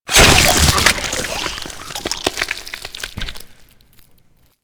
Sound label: Rip In Half, Flesh; Warframe